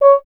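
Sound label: French Horn; Miroslav Vitous; Solo Instruments VOL-2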